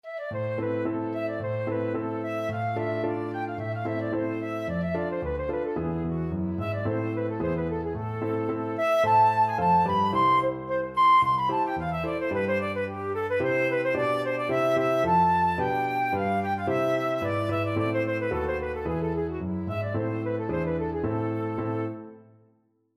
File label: princess royal, flute